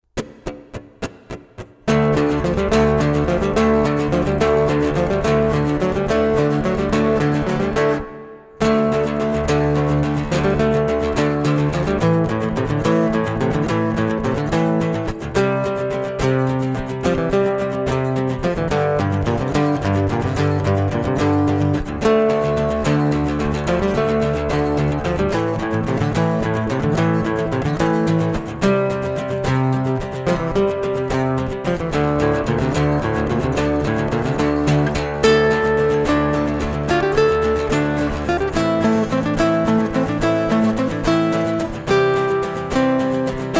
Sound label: acoustic guitar